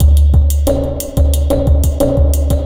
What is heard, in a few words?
slothop 90bpm